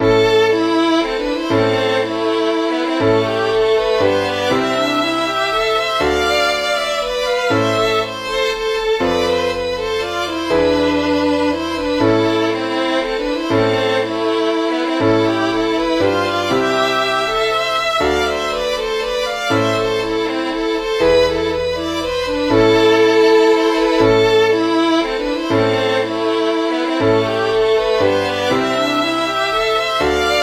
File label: mazurka